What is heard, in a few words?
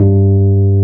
AMB ROAD; Keyboards Old School; USB Soundscan vol.09; AKAI